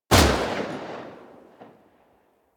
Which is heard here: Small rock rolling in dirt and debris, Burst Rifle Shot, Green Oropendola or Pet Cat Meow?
Burst Rifle Shot